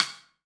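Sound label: snare drum, 180 BPM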